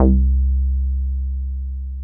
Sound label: Bass